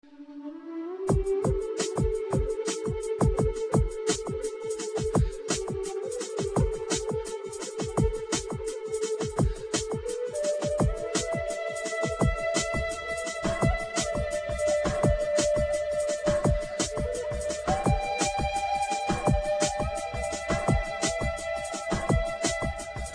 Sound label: fluter